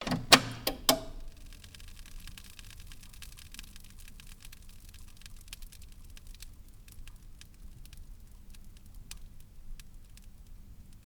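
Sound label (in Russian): выключение старого телевизора треск статического электричества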